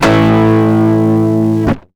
guitar stab

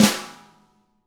DW FLM, SNR; ILIO; Double Platinum Drums 1

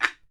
BAMBOO, World Assortment

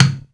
TABLA

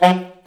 SAX Alto STAB, Alto Section FX

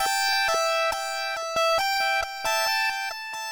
Dubwyzz Keys, Indie Rock

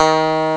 kawai bass piano; Amiga Soundtracker Sample Packs

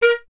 Clarinet A#0; Miroslav Vitous - Solo Instruments VOL-1

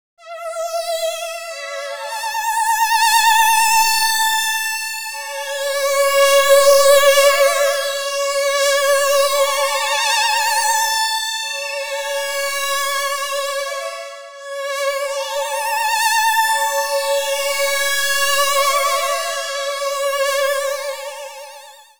Scary Man Lead, Electro Lounge; Sonic Foundry (Sony Creative Software)

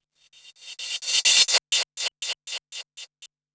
Gated Cymbal; musicradar

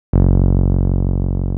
TB 303

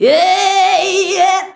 VOX VOX; Sound & Vision - Gigapack II CD 2

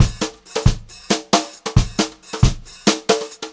136 BEAT, Brushed Drum Loops; USB Soundscan vol.39; AKAI; HOTROD

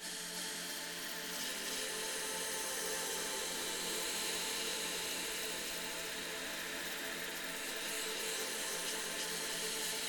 aquamarine pad dm

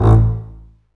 STR CB, Cb Bowed, Cb Marcato; String Master Series

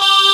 AAH PULS, STRING CHOIR; Pro-Rec; Synthsonic

